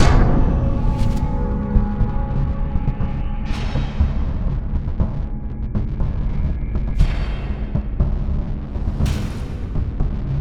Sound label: Scary Hits, Cinematic Trailer Music; BlueFX; Bonus - FX & Transitions